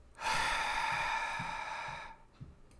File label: Skeleton Gasp Final Breath Death Breath